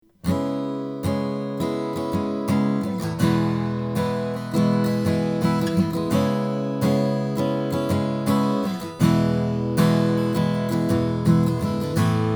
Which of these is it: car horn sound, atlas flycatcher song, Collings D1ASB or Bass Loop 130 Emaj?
Collings D1ASB